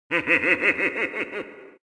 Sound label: evil laugh